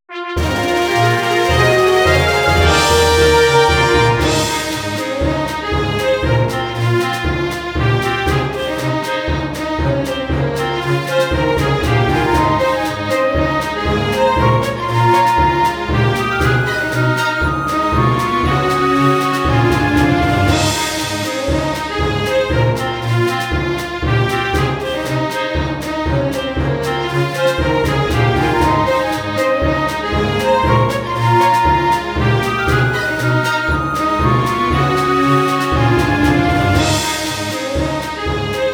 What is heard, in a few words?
Gospel; Luminous Arc 2 Will